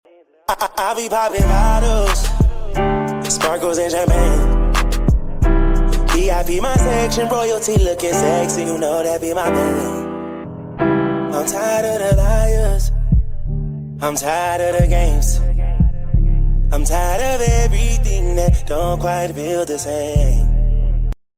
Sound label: popping bottles sparkles and champagne ringtone